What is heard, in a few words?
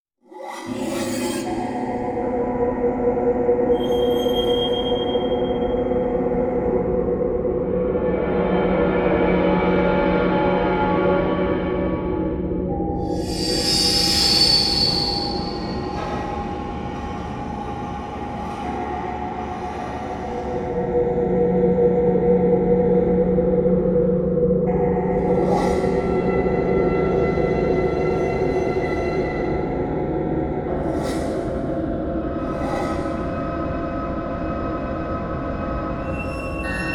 metal riser; Chainsaw Edge Fragments